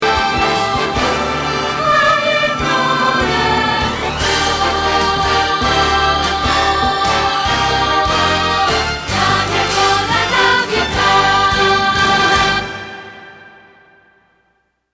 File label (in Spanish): Contralto